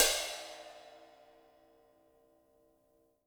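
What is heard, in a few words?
Splash Acoustic Stacked, splash cymbal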